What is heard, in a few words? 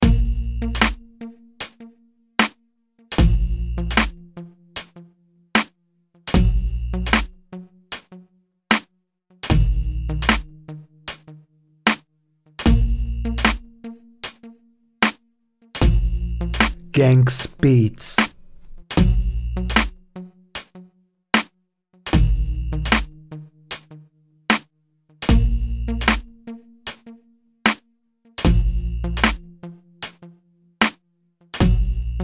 slow boombap dark hiphop